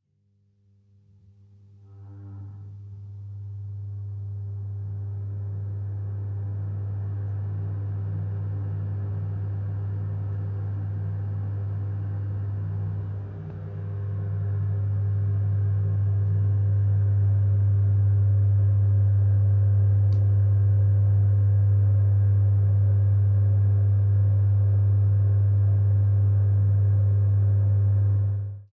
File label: toilet ventilation fan on